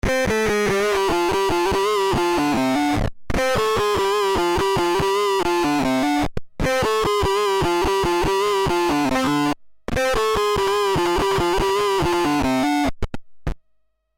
Swarm Fuzz Pedal Worker Queen; Beetronics

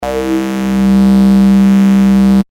Talking Bass C

